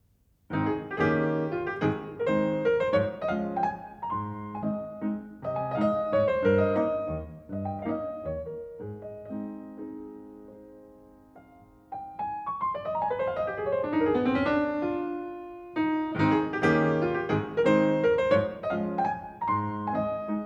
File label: Piano Trio in A Minor, Variation